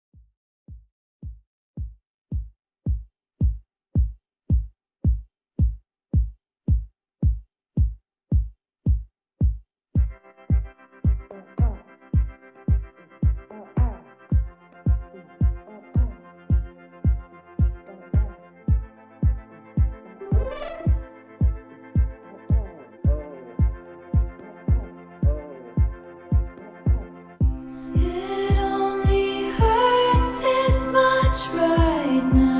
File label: Top40 Mixshow Intro 110 Bpm